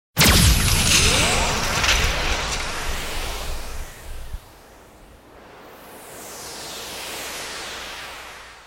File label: Digi Shotgun